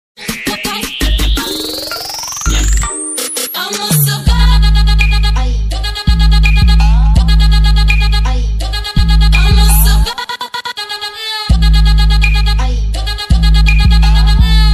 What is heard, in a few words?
Ringtone TRAP VERSION Trap Ringtone